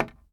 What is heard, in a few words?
wood staff impact